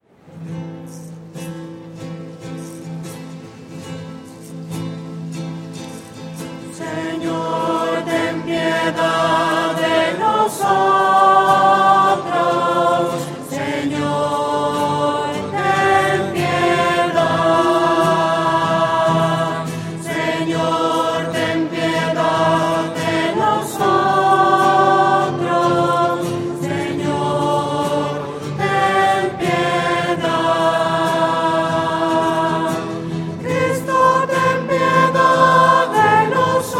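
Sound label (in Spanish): señor ten piedad la menor, voces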